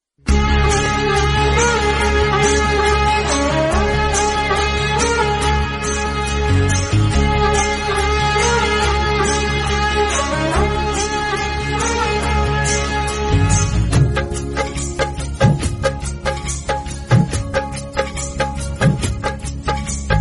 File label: instrumental ringtone